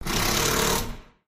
drill

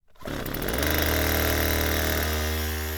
chainsaw start up

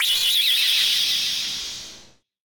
super arts motion

